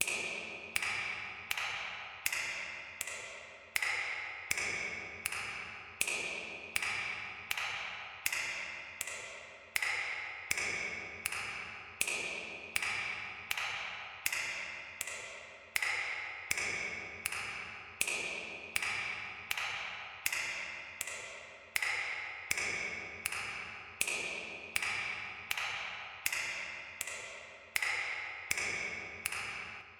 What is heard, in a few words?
countdown timer finger snaps reverb